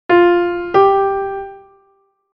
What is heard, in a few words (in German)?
Intervalle sukzessiv